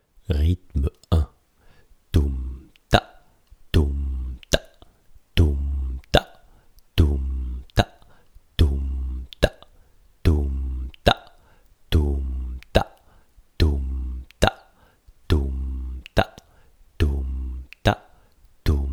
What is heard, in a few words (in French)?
rythme